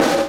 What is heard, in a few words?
Snare Shard; ZeroGravity Drum Shards